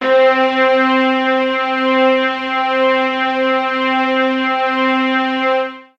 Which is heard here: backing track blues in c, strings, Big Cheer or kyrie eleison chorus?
strings